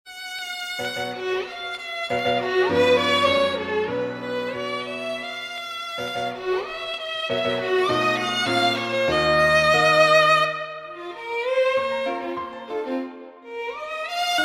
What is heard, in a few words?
Microtonal Violin Sonatina; in B